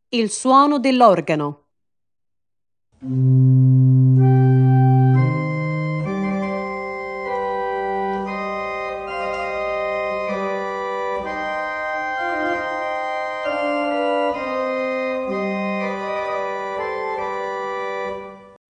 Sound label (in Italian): organo